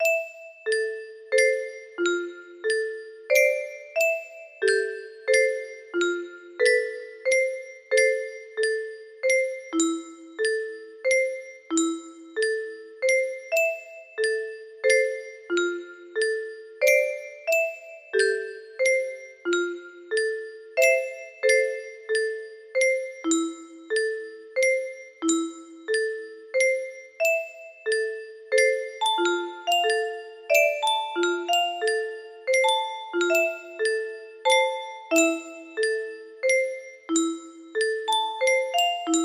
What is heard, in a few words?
music box; layers of fear